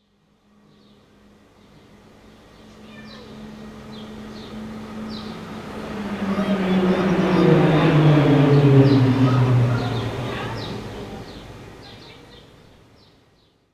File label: Distant Plane; SFX; IRL Recorded